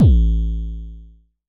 Dist Kicks A1, Vermona Kick Lancet, Distortion Kicks; Drums; LookIMadeAThing Kicks